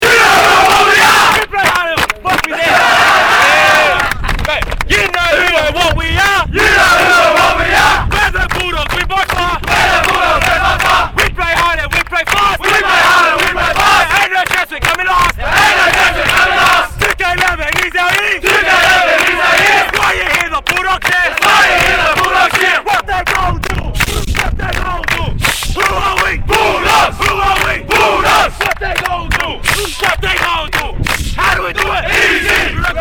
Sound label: team Song; 2011 NSWRL Harold Matthew's Cup - GRAND FINAL - Illawarra STEELERS v Canterbury BULLDOGS